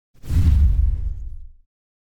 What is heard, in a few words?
Whoosh High Energy Sub; Hollywood Edge; sound designer's toolkit v2